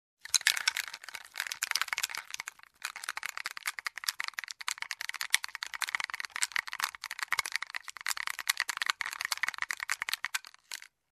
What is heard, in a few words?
Dice roll in an empty bottle